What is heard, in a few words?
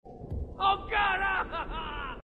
oh god ahhhh aaa, marines; dialogue